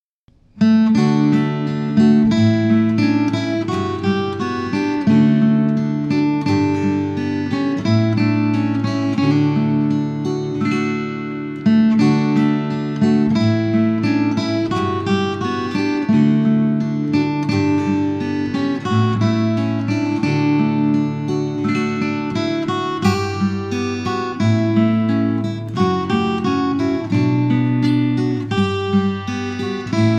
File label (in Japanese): アコースティックギターバージョン